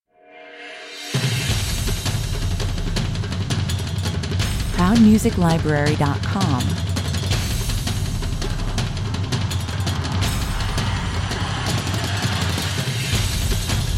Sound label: percussion only